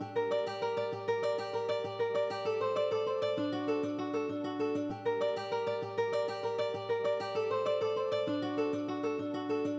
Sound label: 98 BPM G Min Guitar; Loop Stems; Cymatics; Cymatics Gems Vol 8; Czar